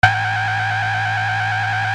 SCIFI

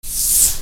PAPER

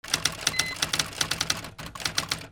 OFFICE

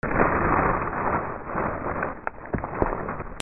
FRICTION

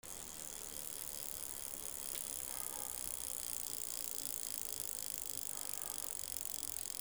GARAGE

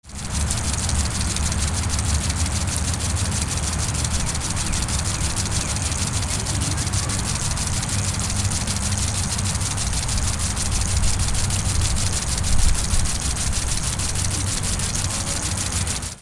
AMBIENCE

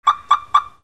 ALARMS

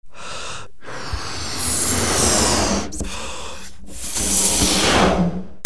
TOYS

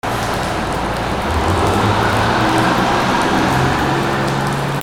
VEHICLES

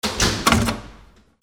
DOORS

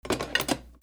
KITCHEN

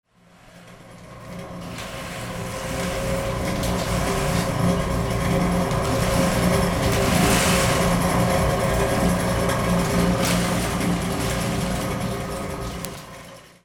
CAMERAS

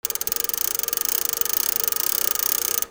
INDUSTRY

